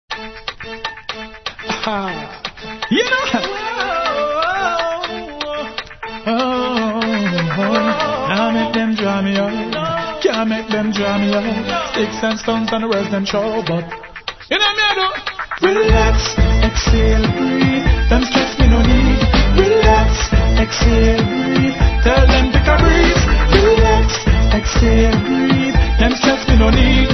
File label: riddim